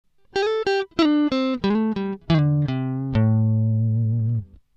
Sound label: slide